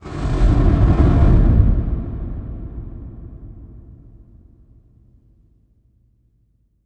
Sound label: impact flame, Cinematic Impact; M-Audio Pro Sessions; Liquid Cinema